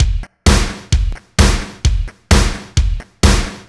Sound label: Drums, 130bpm, Nu Dance Electro; Zero-G; Soundclash; Combi Loops; Thrasher